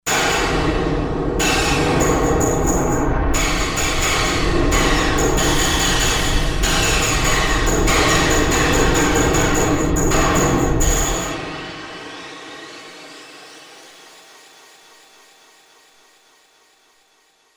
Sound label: SFX, Korg MSC-16S; Environment